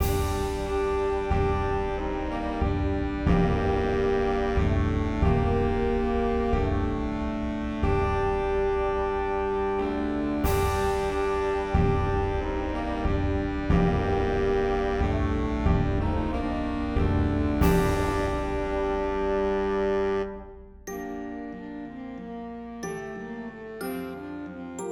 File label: drum major audition excerpt 92 bpm; Band Scoring; CB EAST MARCHING TECHNIQUE